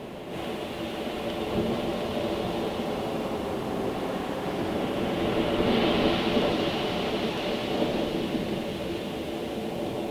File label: Waves